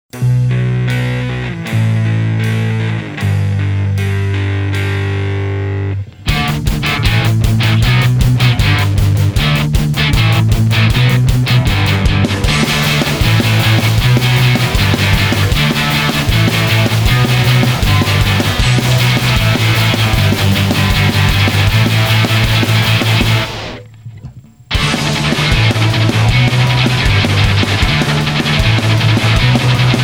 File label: Speed Punk Cover, Instrumental